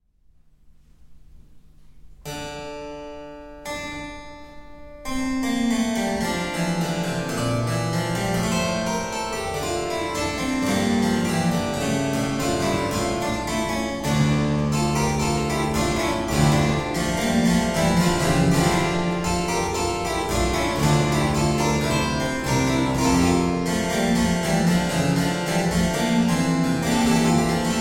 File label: Keyboard Sonata in E Minor, Andante